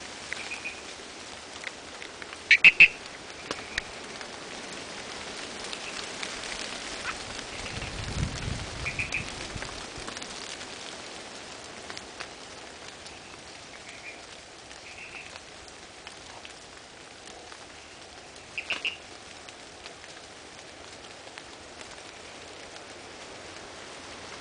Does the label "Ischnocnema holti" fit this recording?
Yes